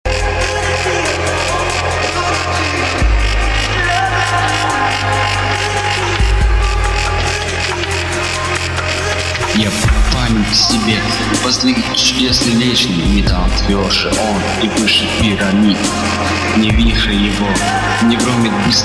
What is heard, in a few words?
Dubstep Vocal remix